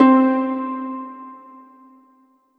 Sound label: Korg M3R Harp String C4